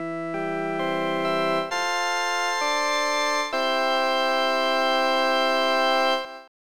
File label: Church; Dragon Quest III